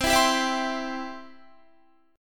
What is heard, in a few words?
C Major, Guitar; strummed; 19,19,19,21,19,20